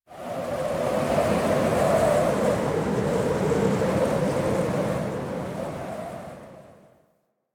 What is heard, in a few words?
Mech Fall Wind; Nechro Mech; Vehicles